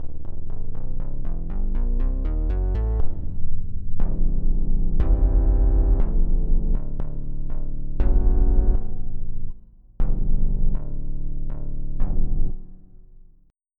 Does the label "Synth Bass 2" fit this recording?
Yes